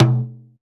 Dof Dom, Percussion; Roland BK-5

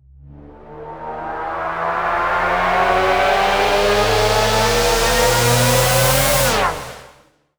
Sweeps Up, Effects; Vengeance; Essential Clubsounds Vol.4

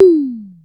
909-Style drum hits; FM 57 - The Ultimate Sample Collection (1997)

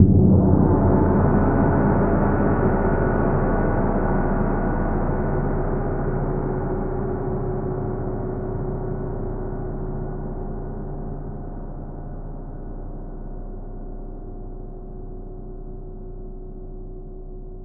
Huge Gong; Metal; Sonic Foundry (Sony Creative Software) - Ambient Atmospheres & Rhythms; Ambient-Metals

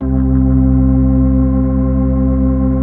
B-3, Full Xfade

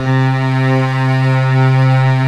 HIGH STRINGS

